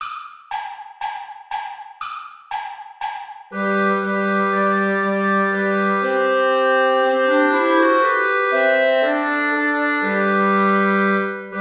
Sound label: play along without clarinet; steuerlein johann mit lieb bin ich umfangen